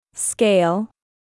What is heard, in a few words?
Scale, bathroom